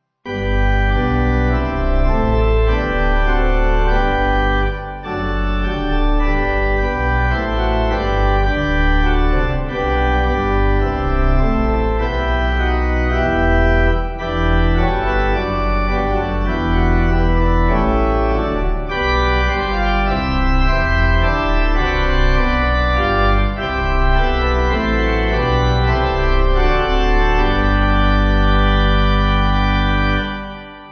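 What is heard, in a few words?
Pipe Organ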